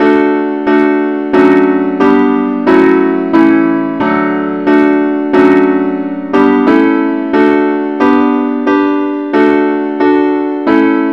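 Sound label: Christe Sanctorum, advance accompaniment, key D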